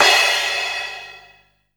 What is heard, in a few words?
Crash, Cymbals; Vengeance Samples